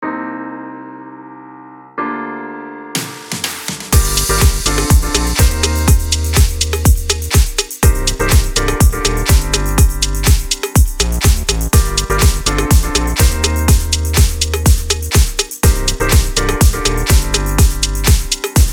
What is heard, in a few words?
(INSTRUMENTAL)